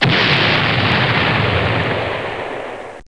explod

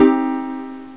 Synth Harp

